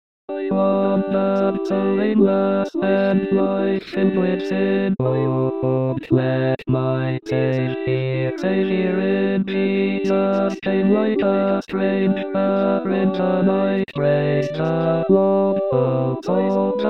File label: Basses